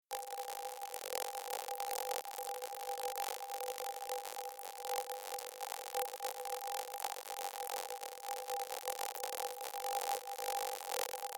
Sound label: EEG spikes